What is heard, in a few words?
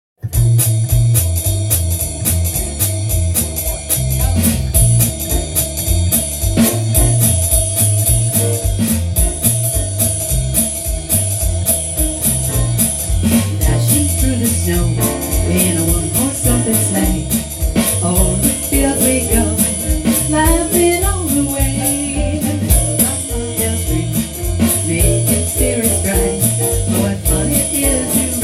jingle bells; vocal, rhythm; rehearsal